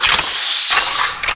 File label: rise mix, srifle open; SiN